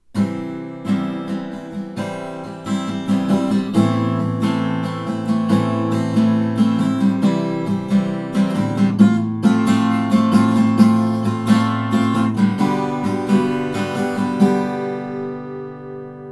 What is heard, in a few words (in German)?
Gitarre